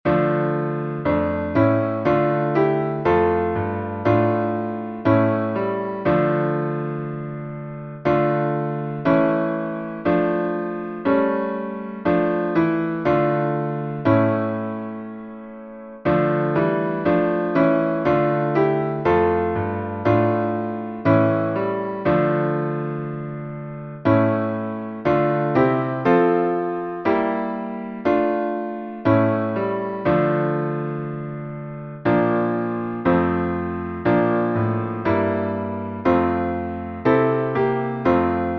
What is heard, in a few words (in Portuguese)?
salmo instrumental